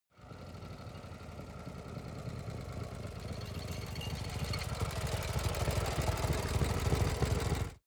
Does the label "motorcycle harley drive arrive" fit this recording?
Yes